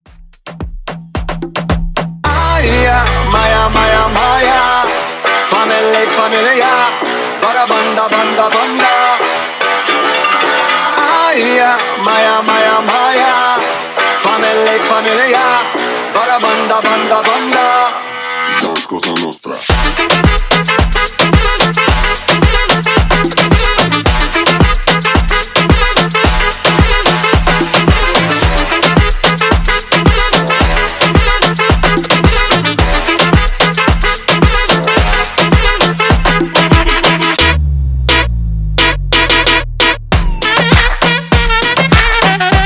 Moombahton Transition 110 100 BPM; remixplanet

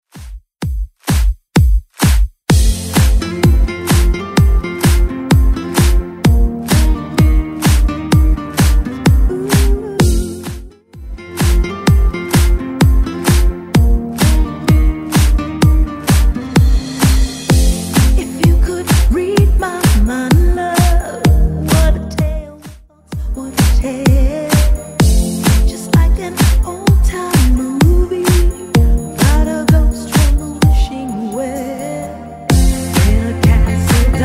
90s Redrum 128bpm

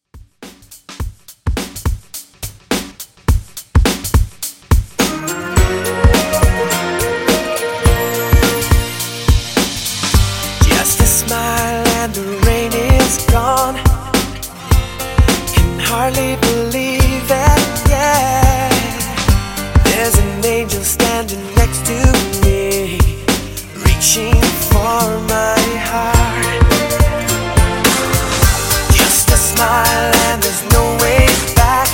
Classic Redrum 108 Bpm

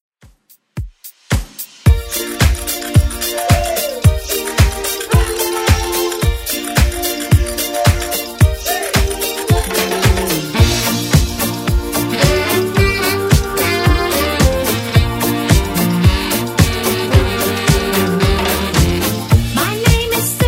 80s DJ Friendly Redrum 110bpm

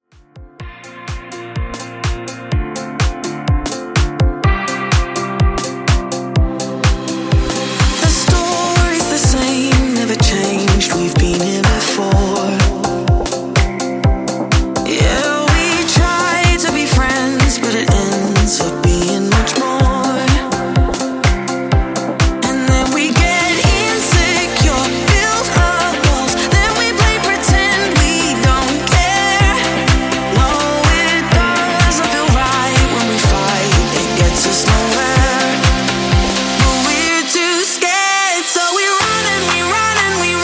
Electronic Club 125Bpm

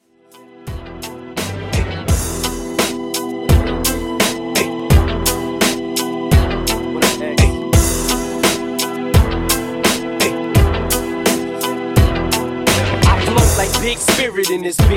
Rap Redrum 85Bpm

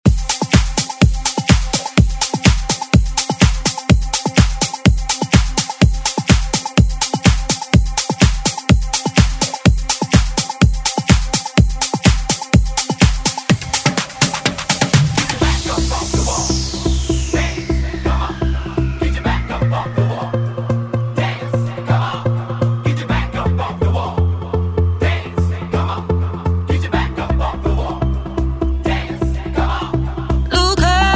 House Redrum Intro

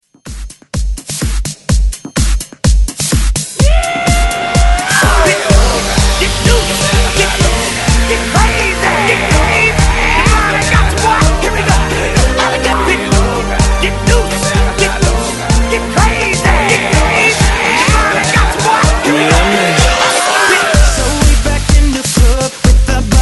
Hype 126 Bpm

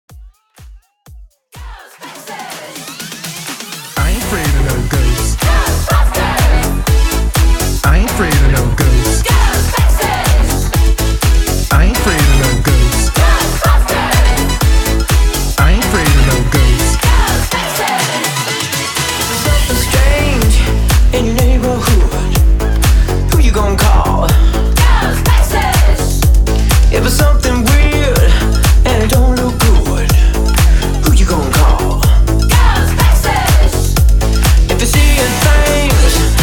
HYPE 124 BPM

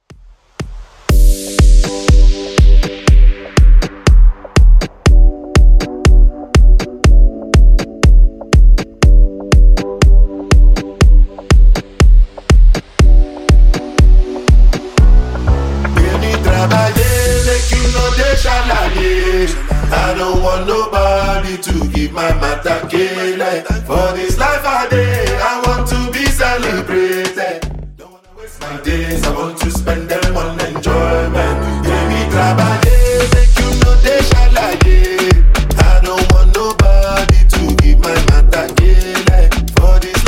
Top40 Simple Redrum 123bpm; remixplanet

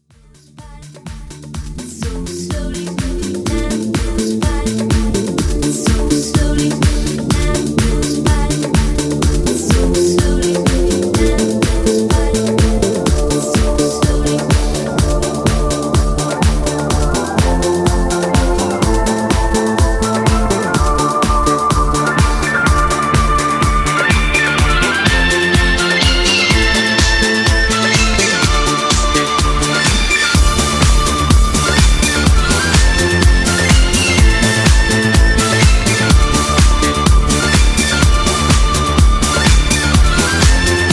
ReDrum, 125 Bpm

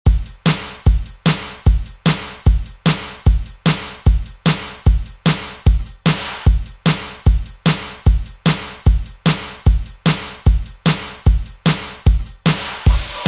80s, 124 Bpm; Redrum

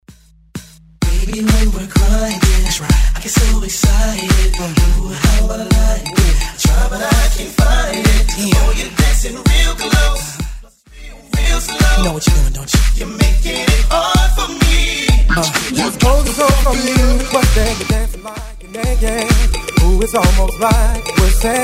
Banger Bootleg 128bpm

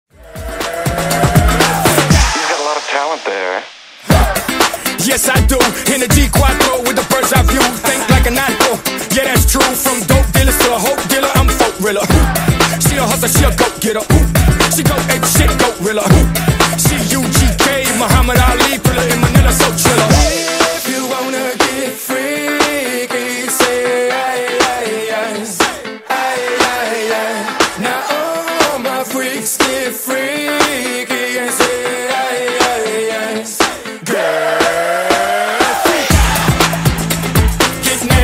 Re Drum Top40

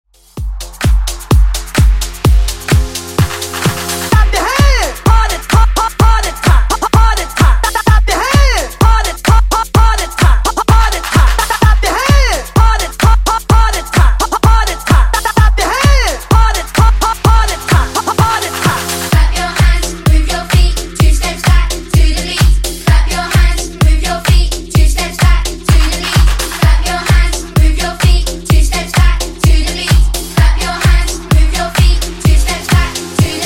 Hype Intro 128bpm

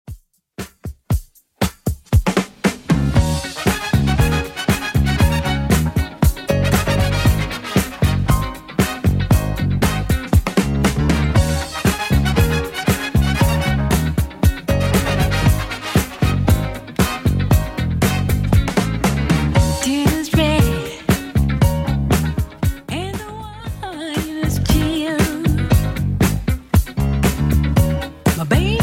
117 bpm

